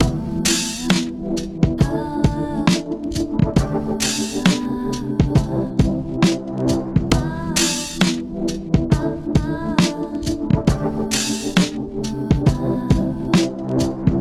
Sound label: trip hop; tremphatic; Wire Grind